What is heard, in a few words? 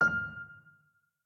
button Hover; netent; copycats